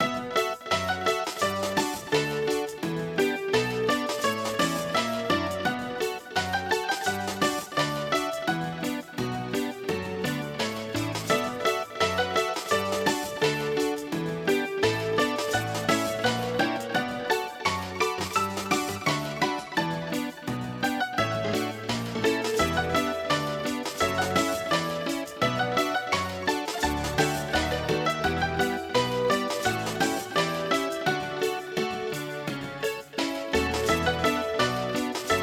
upbeat drum

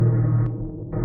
Sinedrone danger music

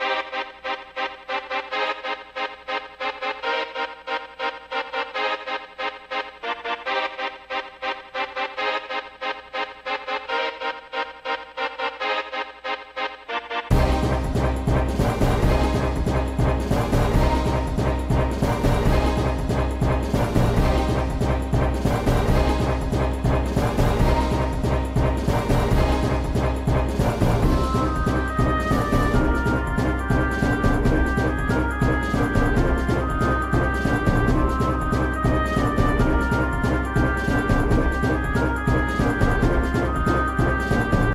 Boss (Orchestral) music; King

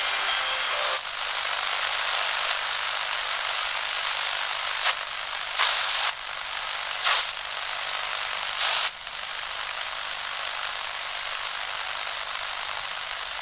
Static placeholder music

